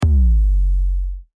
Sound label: bass